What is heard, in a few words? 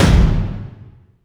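MISC Right, Techno 138 BPM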